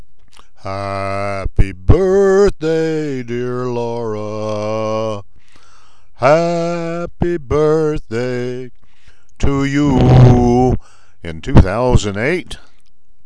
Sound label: birthday singing